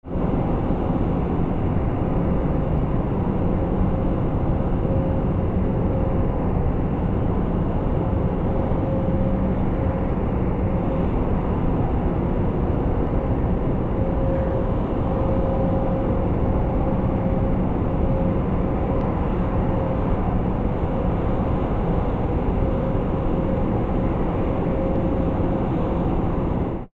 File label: standby